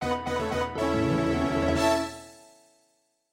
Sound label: MIDI Fanfare; Carmen Sandiego Junior Detective Edition